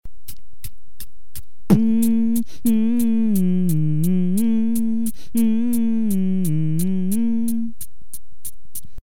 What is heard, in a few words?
hihat